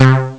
dumpf bass; ST-XX Amiga Soundtracker Sample Packs

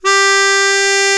MUNDHARMO; Alpine Volksmusik

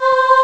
chor; Amiga Soundtracker Sample Packs

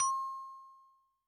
Glocken M, Yamaha DTXpress-II Instruments

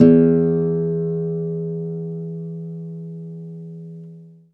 KONZERTGITAR, Alpine Volksmusik

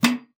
Ventil; Xsample VOL-3; STACC+FX

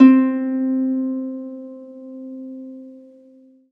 HARFE C1; Best Service

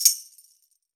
TAMBURIN, PERKUSSION; Alpine Volksmusik

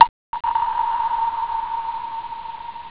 tropfen; ST-XX Amiga Soundtracker Sample Packs

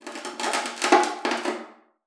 KNUSPER, VIOLA FINGER; Xsample VOL-5

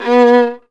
GEIGE; Best Service; Alpine Volksmusik

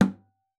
KLOPF, GUITAR FX; Xsample VOL-2

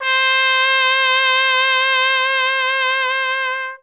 TRP WEICH C2, TROMPETEN, Alpine Volksmusik; Best Service

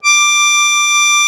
AKK VIOLI, akkordeon; Best Service; Alpine Volksmusik

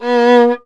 GEIGE; Best Service; Alpine Volksmusik

